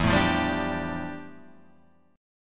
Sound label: F Major 13th E, guitar chord